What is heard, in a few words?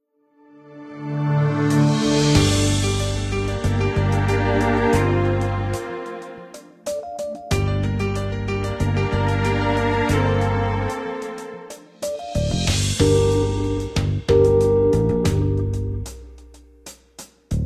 prelude, music trial